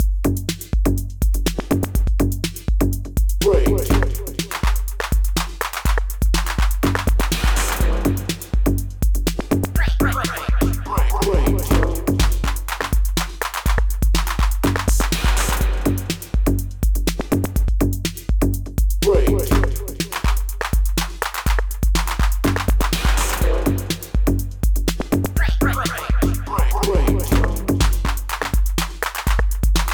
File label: electro big beat collection; solidtrax